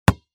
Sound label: Sports Basketball Bounce